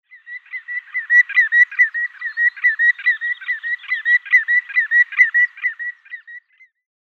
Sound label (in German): Gruenschenkel Tringa nebularia; Vogelstimmen